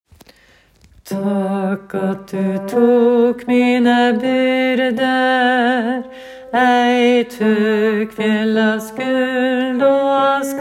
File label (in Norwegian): takk at du tok bass; åfjord